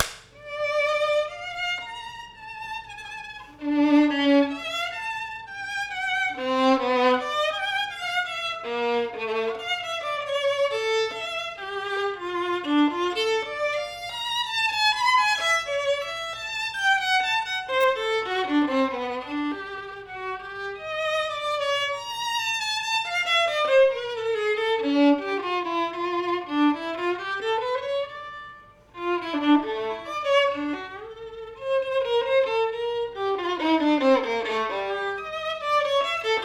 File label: piece searching